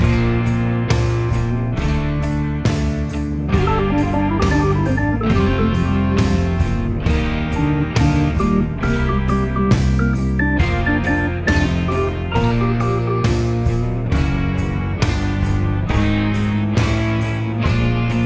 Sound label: Bb C Chromatic Scale